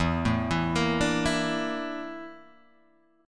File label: Bb Major 7th Flat 5th